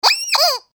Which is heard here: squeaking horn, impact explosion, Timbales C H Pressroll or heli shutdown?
squeaking horn